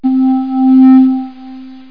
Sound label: Space Flute; Kids Can Save the Earth